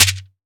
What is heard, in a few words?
SHAKE BODY, SHAKER; AKAI S-Series CD-ROM Sound Library VOL-5; SHAKER.MARAC